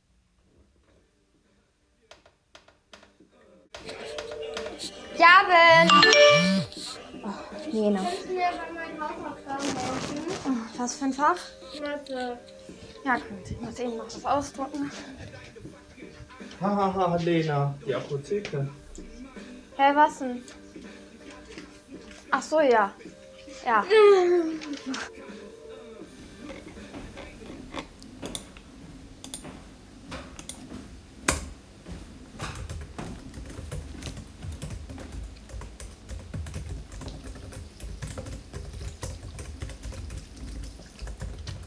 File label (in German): Soundscape, Distanzunterricht; Soundscape „Distanzlernen“; Musikunterricht